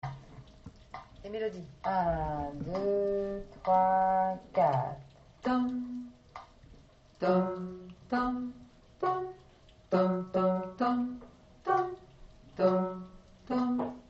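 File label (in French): le bolero intro et 1er couplet soprano melodie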